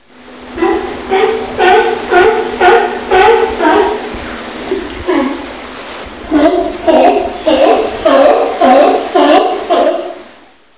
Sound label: sea lion